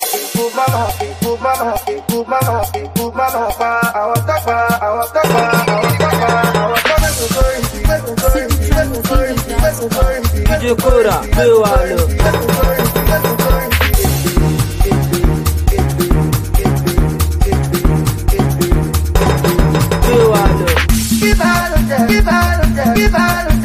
Gbemisori Groove

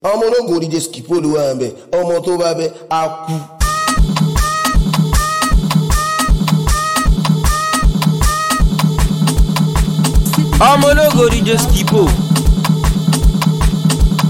Mara Beat

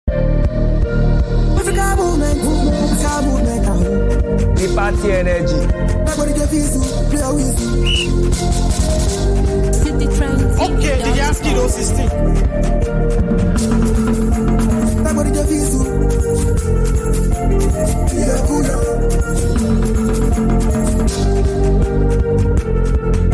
Mara Pupo Beat

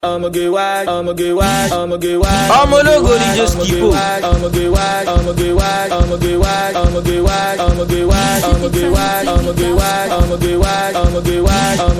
Mara Beat

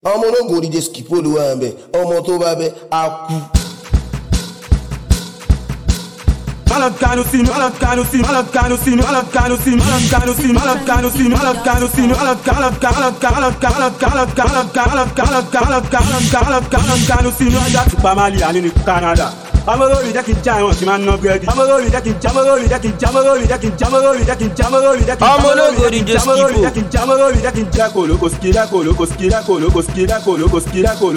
Beat

